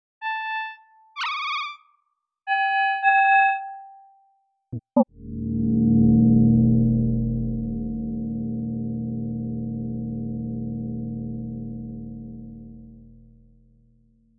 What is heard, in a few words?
chime